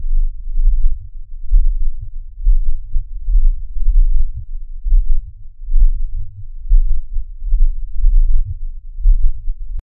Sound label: a deep slow sub bass pulse